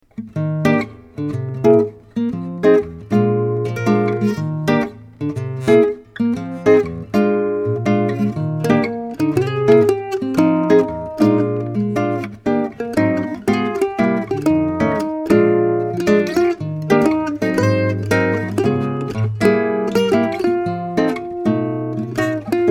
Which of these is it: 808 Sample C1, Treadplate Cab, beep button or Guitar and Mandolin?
Guitar and Mandolin